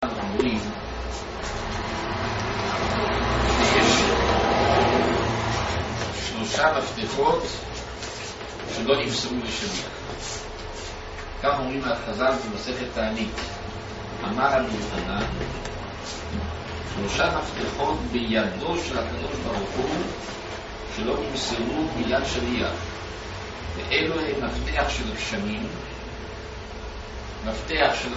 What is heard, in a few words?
rain